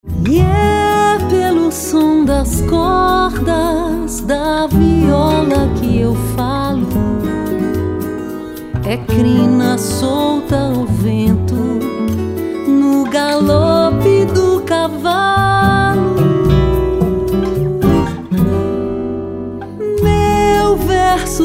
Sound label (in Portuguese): Toada Mineira